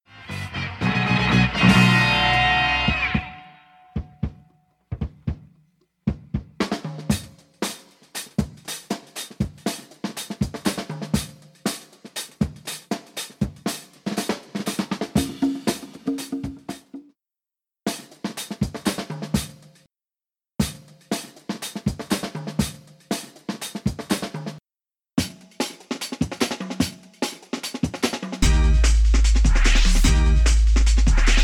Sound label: Break